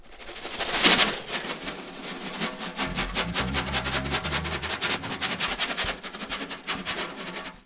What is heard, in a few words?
Snare Drum; Percussion Samples